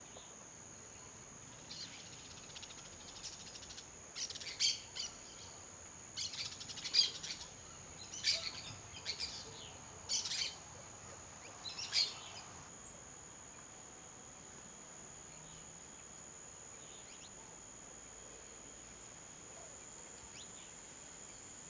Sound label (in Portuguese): Periquitos indo embora em Vila de São Jorge; aves; canais surround 5 1; Coleção Sonora do Cerrado